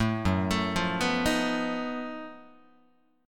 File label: Gb Minor Major 13th Gb down Guitar; arpeggiated; JGuitar